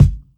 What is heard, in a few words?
low tone bass drum sound G sharp key